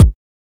focused kick single hit g sharp key

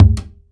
kick f sharp key, Loudest frequency: 256Hz; kick drum samples; one shots

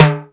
high floor tom sample d sharp key; tom drum

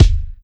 loud steel kick drum sound g sharp key

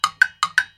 jam block studio sample; percussion; loops

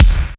rich high end kick drum single hit f key

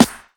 low tone snare drum a sharp key; snare drum samples

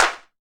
sharp clap one shot f key; single hits one shots

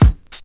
rich high end kickdrum sample c sharp key

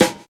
dark sounding steel snare drum E key; snare drum samples

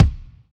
kick b key; drum single hits one shots; kick drum samples

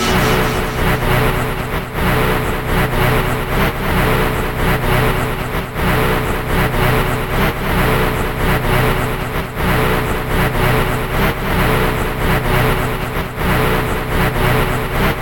rave sequence - B - 126; synth loops; spirit

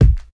dry kick drum A key; kick drum samples

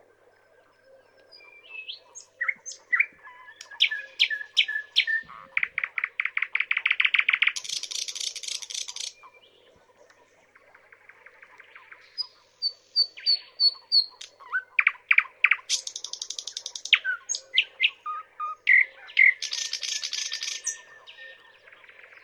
thrush nightingale song

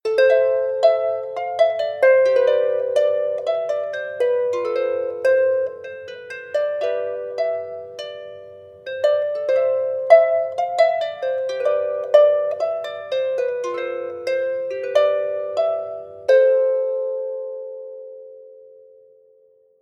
celtic harp improvisation

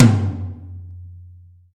roomy steel snare drum sample g sharp key

